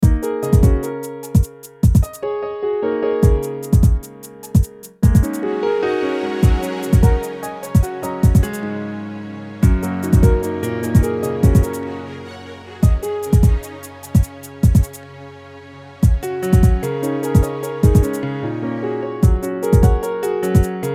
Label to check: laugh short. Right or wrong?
Wrong